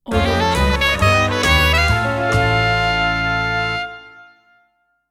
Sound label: cartoon dizzy sax logo